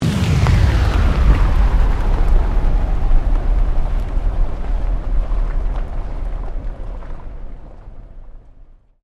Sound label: Sweep Mechanical Slow; Created sweeps, swooshes and other movement effects; Sweeper; Sweepers (Sound Effects:Sound Design); SoundEffects-Foundation4